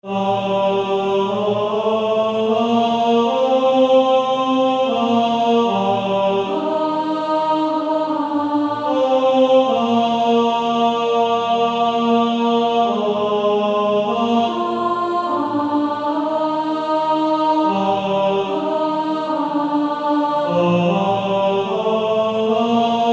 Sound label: Male Choir; Lead Me Gently Home Father